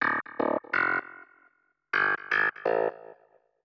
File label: SYNTH HOUSE 125 A; eJay; Keys